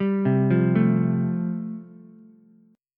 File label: G 7th Suspended 2nd Suspended 4th G chord, Ukulele; G7sus2sus4 arpeggiated